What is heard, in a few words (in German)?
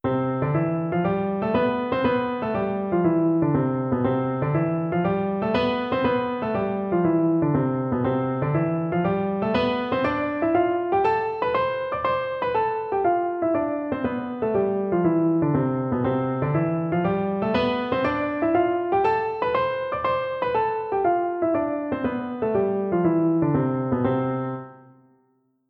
Tonleitern Spielen C Dur dotted; Klavier